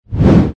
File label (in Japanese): こん棒を振る モノラル; 武器効果音